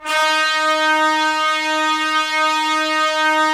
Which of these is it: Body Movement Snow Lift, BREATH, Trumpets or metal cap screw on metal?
Trumpets